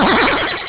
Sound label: spell ok; openrsc